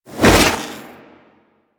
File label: Trooper Melee Whoosh; Warframe; DE; Sentients